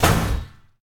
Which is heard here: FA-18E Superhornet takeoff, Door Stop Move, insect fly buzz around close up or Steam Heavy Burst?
Door Stop Move